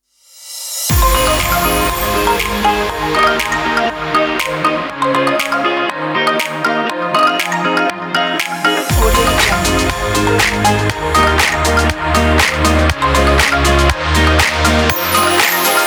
summer guitar pop

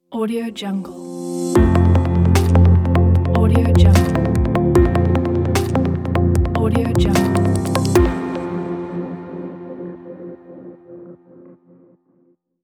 inspiring ambient glitch logo

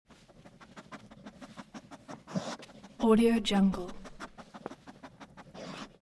animal dog stafford eat sniff long; smartsoundfx

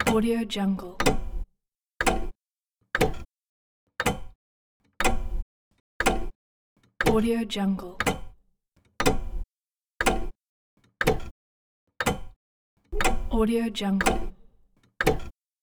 ticking clock

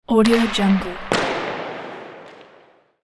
puck shot at boards; sound-ideas

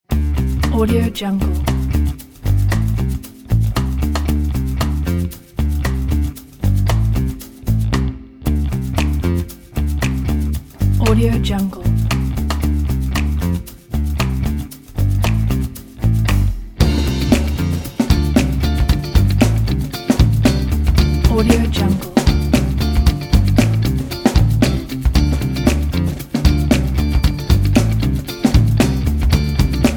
funky background

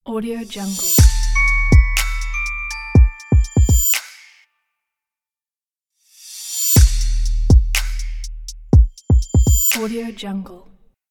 animation logo reveal

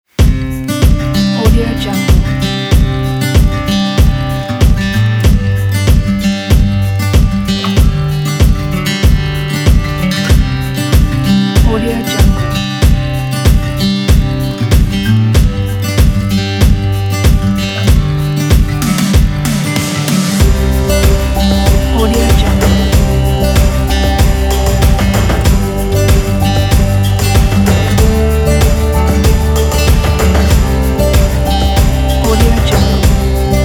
acoustic inspiration

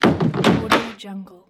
wood door close lock

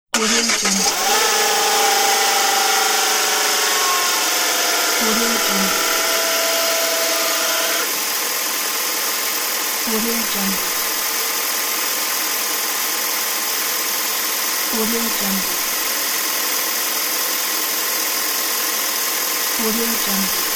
car starting

